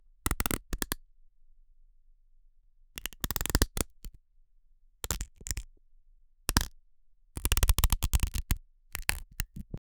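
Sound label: tightening grip on a plastic, toy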